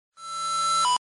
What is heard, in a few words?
coin, reverse